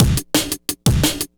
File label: Stepper Break